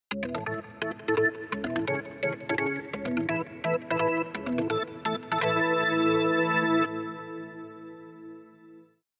organ

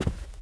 run carpet

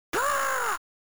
bird squawk, Amiga

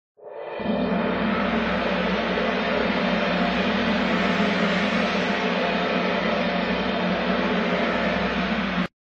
Cymbals; Alice: Madness Returns unreleased original soundtrack

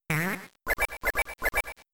Metronome; pokemon; gen 3; attack moves

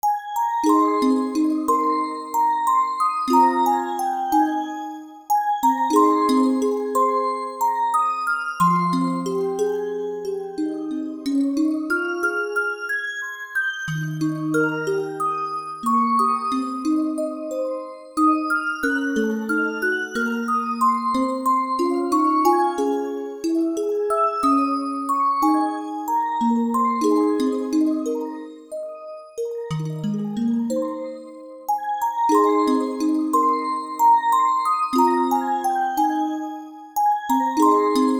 Like a Sunflower (Orgel); Suzu ga Utau Hi Original Soundtracks

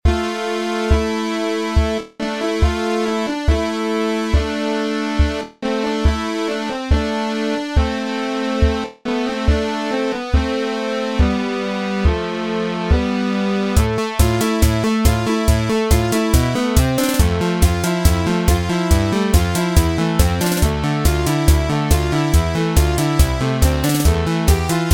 Main Theme Chiptune Version; Project Warlock

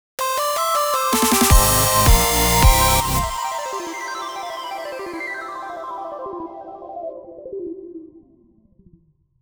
Victory Fanfare; toree 2; pc switch

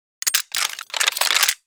Painting Door Key; Yesterday Origins

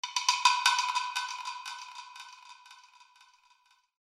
wood

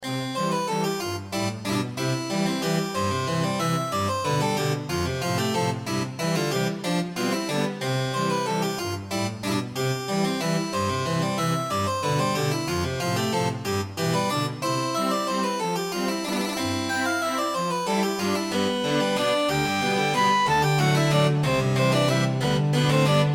Waltz; Ahriman's Prophecy; RPG Maker

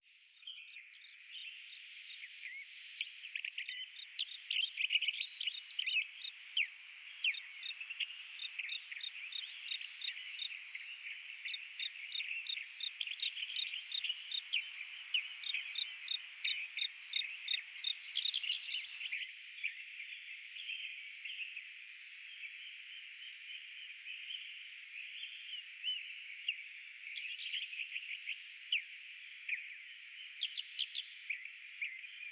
Birds Forest Park; Lucius III

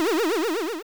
Portal

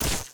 Ambient Electric Shock; Among Us

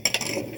pinball bell; Progressbar95

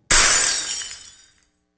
Crashing; silent hill 2

